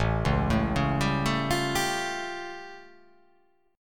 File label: A Augmented 7th A